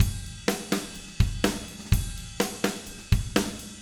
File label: 125 60S Rock Drums Chorus; Pop Rock; Fast Tempo; Digidesign; Pro Tools; Pro Tools Essential Loops